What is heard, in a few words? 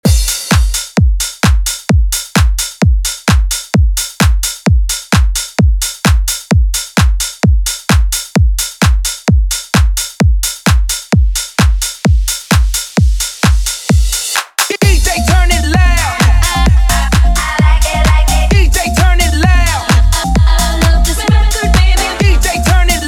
bootleg hype 130 bpm